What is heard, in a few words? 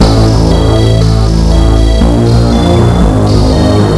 Transition Music; AmoebArena